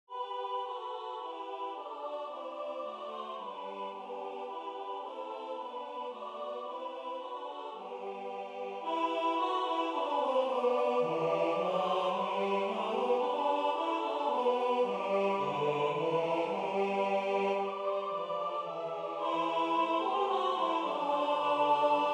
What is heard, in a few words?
Angels from the realms of glory TENOR